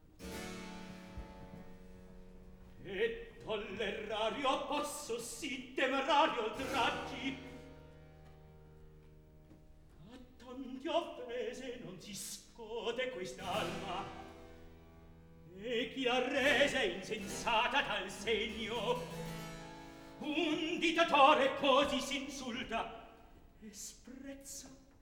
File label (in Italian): Atto Primo Recitativo ed accompagnato; Silla; Mozart; Operas; KV 135 Lucio Silla - 1772